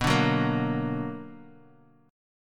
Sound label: B Minor Major 7th B Guitar, chord; strummed